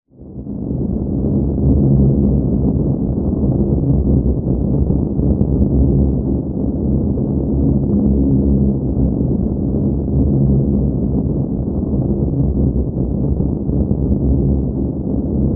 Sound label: Solar Hum Loop